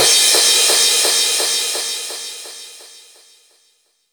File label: Crash; Vengeance Samples; VEE Cymbals